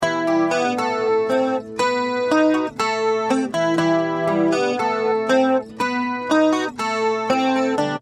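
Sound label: acoustic guitar, Dimension C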